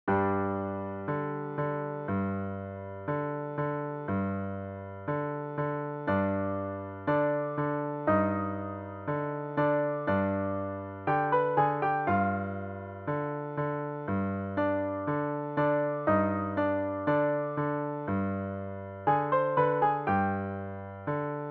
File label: Middle eastern, birthday